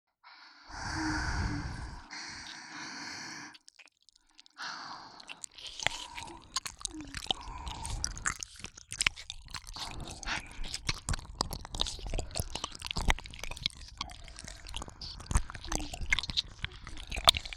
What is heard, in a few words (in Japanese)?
全身くちゅ音だけ, ASMR